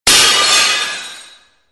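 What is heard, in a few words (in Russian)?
звуки столовых тарелок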